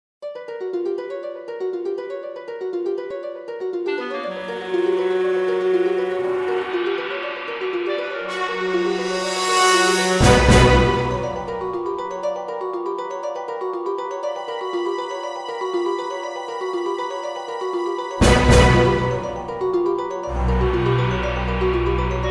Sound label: Jingle